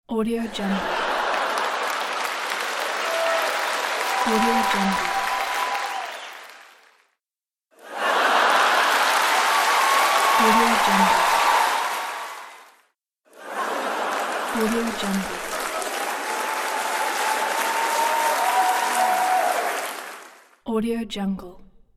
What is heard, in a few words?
the crowd laughs and applauds at the comedy show